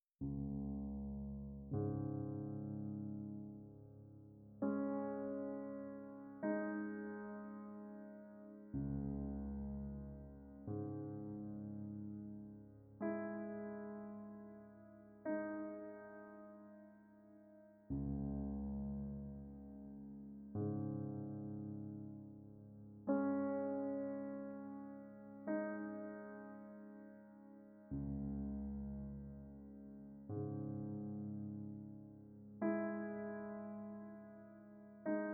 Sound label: Music SuspensefulBack; Lucius III